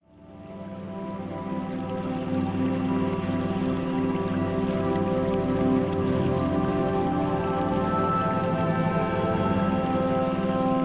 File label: Alpha Meditation